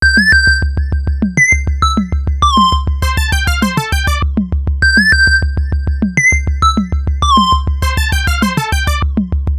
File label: techno